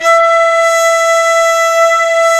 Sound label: mute flute, hybrid winds